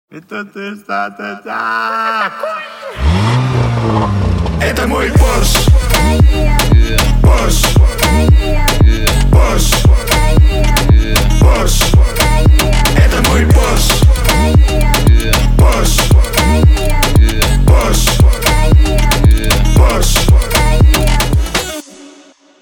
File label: rington